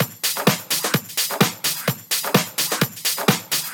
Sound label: House Loop, 128 BPM; Vengeance Samples